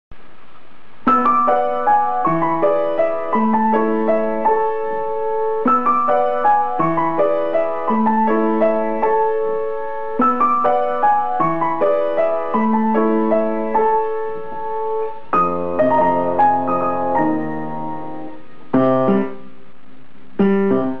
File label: Piano; Sound Effects